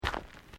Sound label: Footsteps Boots Gravel Fine Step; Footstep Foley; Human